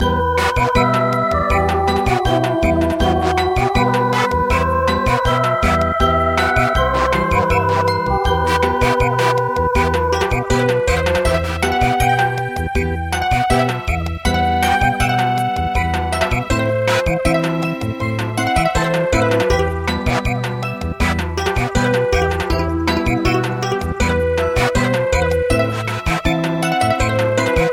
Samba; Danchone Bushi

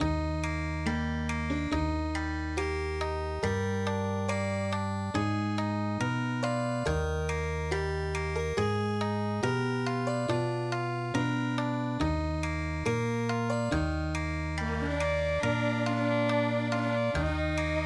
Nakijin Nar Kuni Okinawa

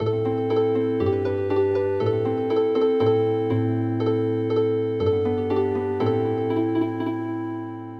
Healing Harp

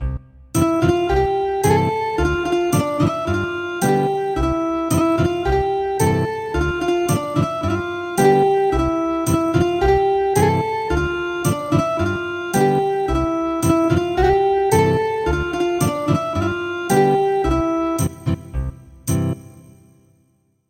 Ethnic Guitar; El virolet

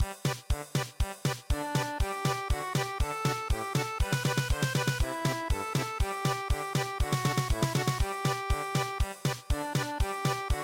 German Polka

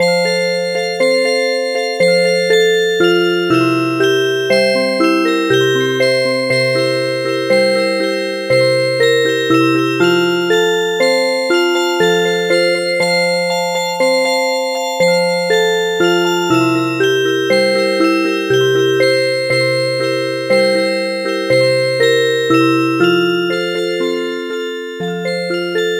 Music Box

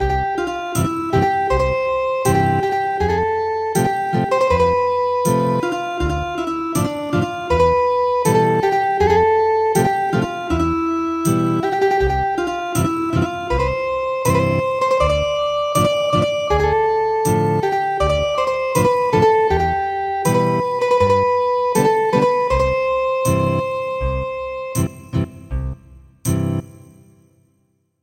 Ethnic Guitar

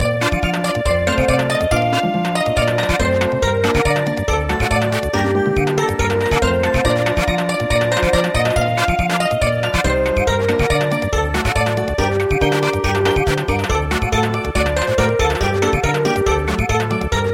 Samba; Ukraine has not yet perished

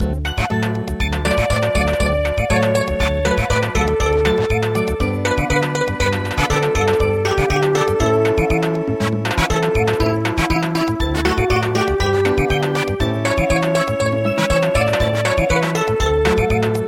Samba, midi

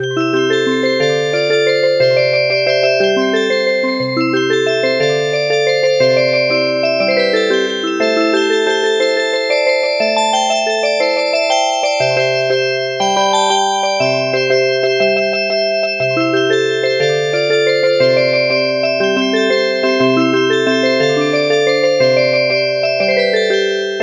Music Box; Thaleba

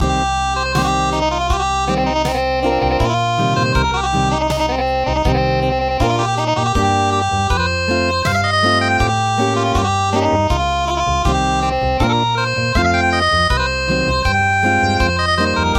Acoustic; Gold cup

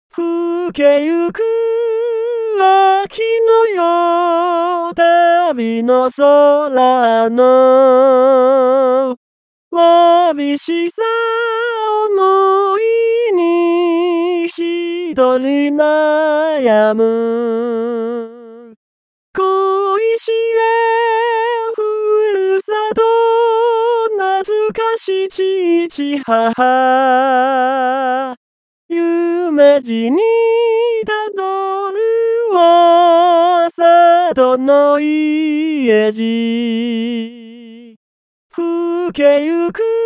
male

